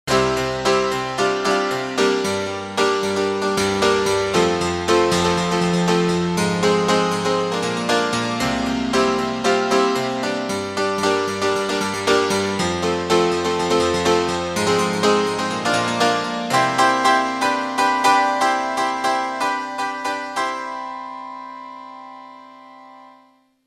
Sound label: Bright Piano; Roland M-DC1